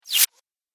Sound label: Chirpy Whoosh; sound design